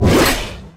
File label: open Modal; qs-advancedgarages